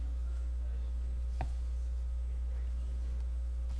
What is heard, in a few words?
cry